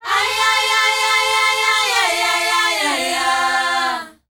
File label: AYEYAYA, World Voices, S. AFRICAN; ILIO; Vocal Planet